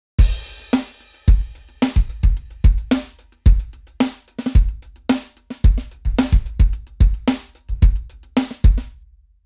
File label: GRETSCH SNARE HIGH TEST